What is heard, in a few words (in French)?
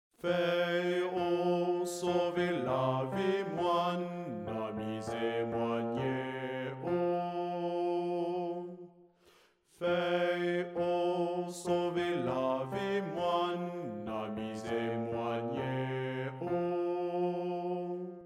BASSE